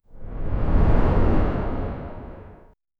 ui sound effect, time

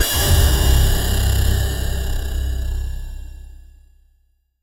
rapid energy sweep

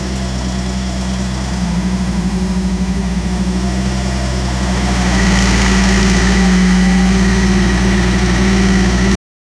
vespa scooter cruising

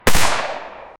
a single shot from a, rifle

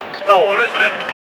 tower defense game won, achievement

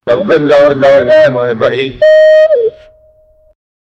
quiz show jingle; gameshow

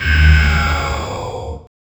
blood priest demon dying

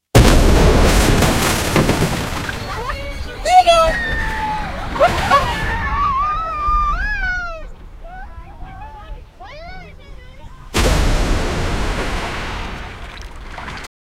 ship buckling and explode; breaking

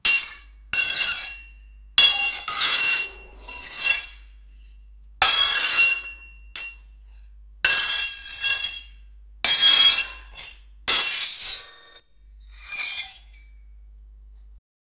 claws scraping metal

